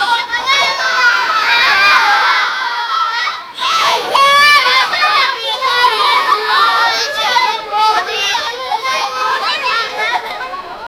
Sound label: kids rushing out of schoo; children